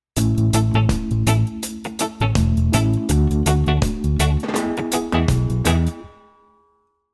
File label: guitar pattern